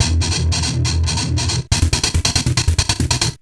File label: Treat Guit, Industrial; Nasty